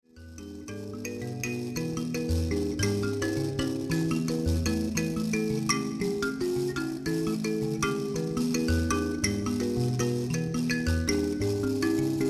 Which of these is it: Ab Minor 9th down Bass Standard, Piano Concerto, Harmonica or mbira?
mbira